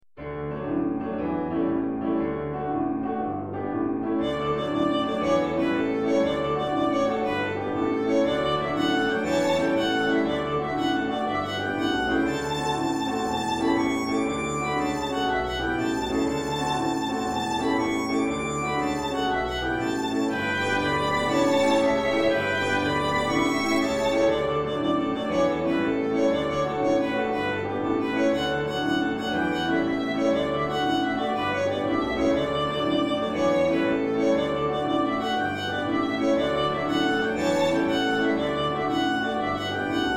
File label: Elven Jig Instrumental Version